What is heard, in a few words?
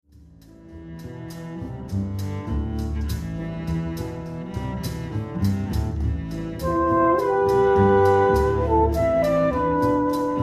Vocalize